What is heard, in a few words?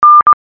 n tone